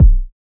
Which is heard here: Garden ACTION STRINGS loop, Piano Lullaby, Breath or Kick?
Kick